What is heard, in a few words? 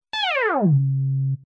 synth space weird synthy sci fi melody accent fail; Jessey Drake